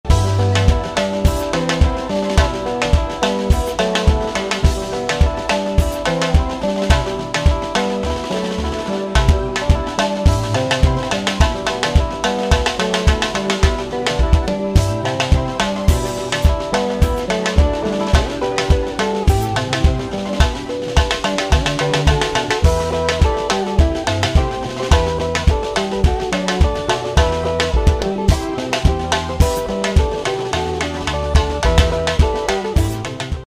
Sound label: Gospel Band dance